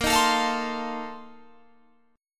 Bb Major 7th Suspended 2nd Suspended 4th, Guitar, Open G; 20,20,19,22,22,22